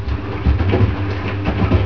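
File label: washing machine; MACHINES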